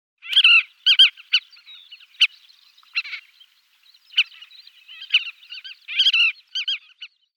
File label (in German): Rotfluegelbrachschwalbe Glareola pratincola, Vogelstimmen